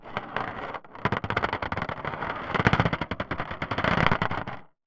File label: Corrigated Iron, contact mic, Marbles; musicradar; contact mic madness; Raw